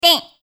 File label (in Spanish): golpes